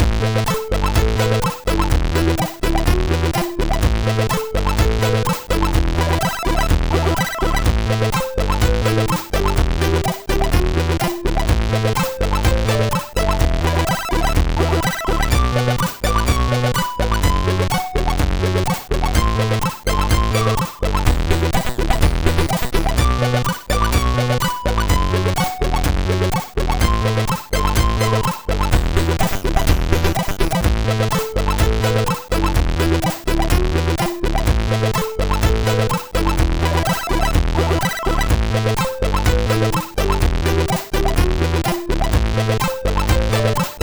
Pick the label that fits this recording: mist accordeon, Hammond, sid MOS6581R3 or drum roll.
sid MOS6581R3